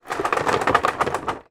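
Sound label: leisure board game pieces in box shake; yahtzee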